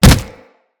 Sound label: Generic Hit; Tenno